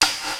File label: INDUSTRY HIT; Rubber Chicken Software Sample Bank; DRUMS + PERC